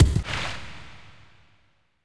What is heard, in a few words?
Zombie Run